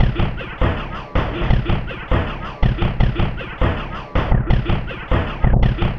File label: Beat, Drums, Eclectic Electronica